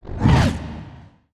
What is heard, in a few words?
asteroid whoosh by